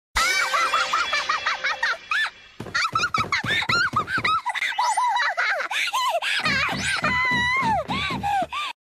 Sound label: anime girl laugh attack